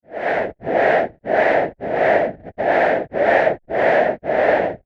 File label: synth Line